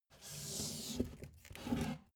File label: take book soft